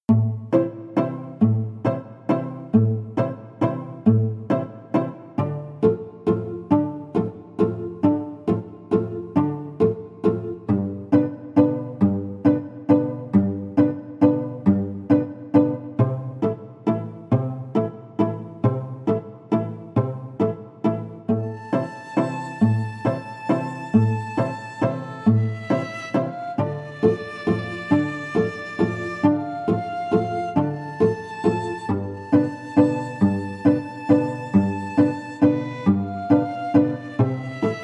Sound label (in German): streicher